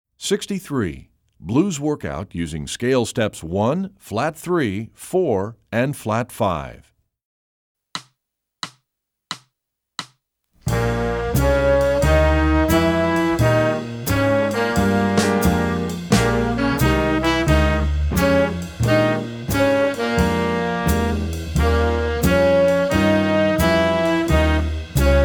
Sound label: blues workout blues scale